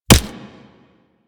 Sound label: Bullet Impact Flesh; Warframe; Weapons; Player